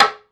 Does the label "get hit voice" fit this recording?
No